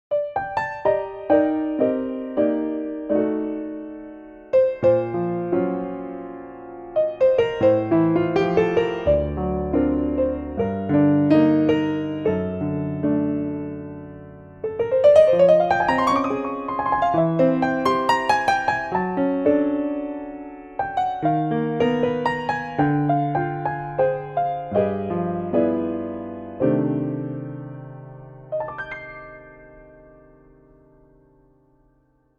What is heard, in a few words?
piano